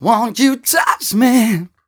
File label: m 130 mel d-flat, Vocals